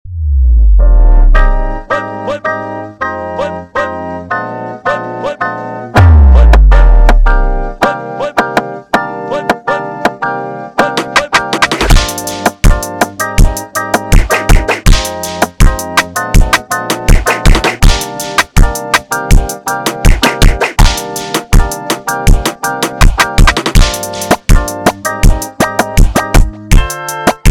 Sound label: Brega slowed; funk